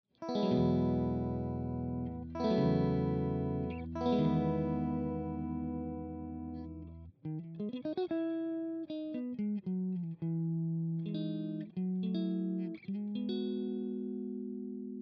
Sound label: Clean Chords Torpedo Orange 2x12